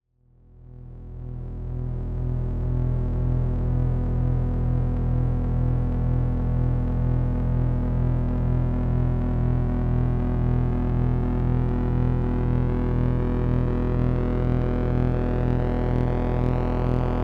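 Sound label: filter sweep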